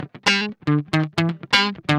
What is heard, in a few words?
GUI, LICKS; 120 LICKS